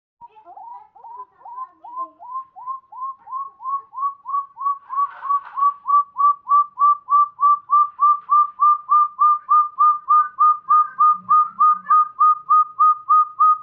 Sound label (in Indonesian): suara puyuh gonggong jawa